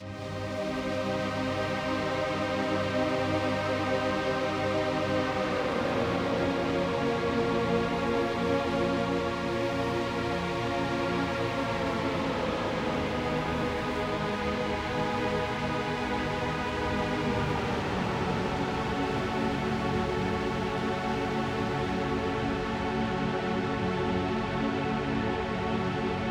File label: Pad; neuro; InsideInfo